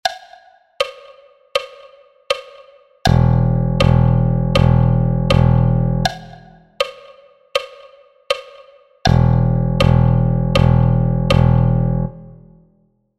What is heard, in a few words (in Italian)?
Suonare il basso elettrico semiminime